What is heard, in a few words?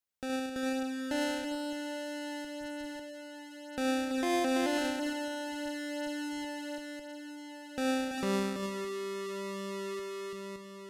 Loading; Street Cleaner The Video Game